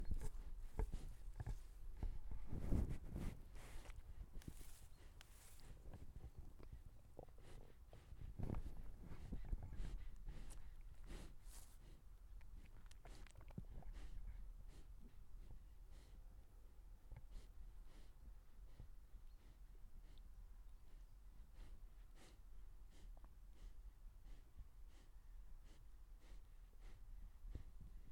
Sheep breathing

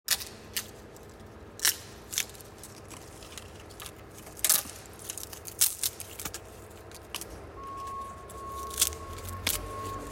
Creaking branches

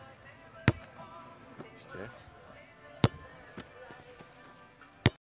head pass

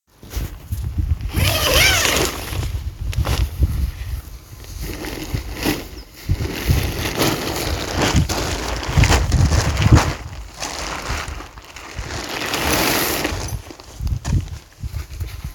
Unfolding the tent

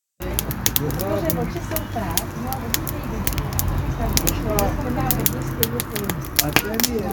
Fire party

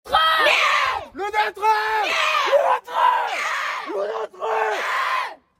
Scouting call

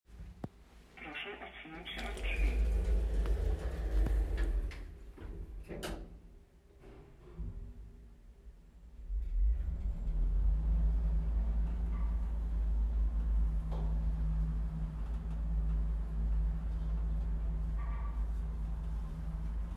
Elevator

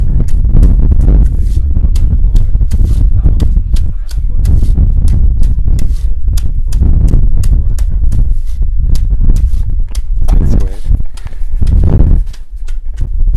The thatcher

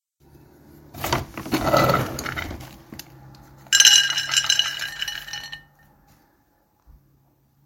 Feeding the dog

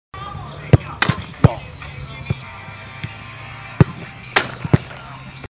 shot into the net

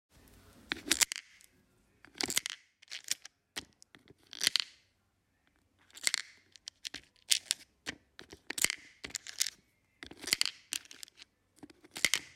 4 in a row

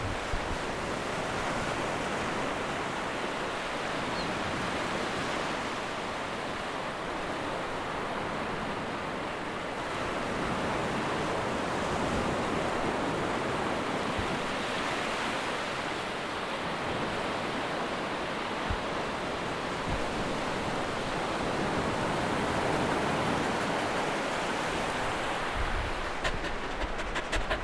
sea

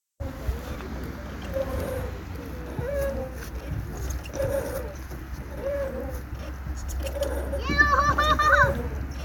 Swing

